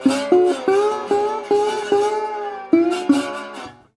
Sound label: Blues Guitar Samples; ILIO - Fretworks